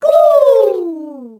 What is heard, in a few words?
NORMAL CROW